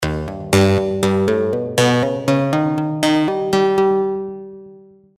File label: flip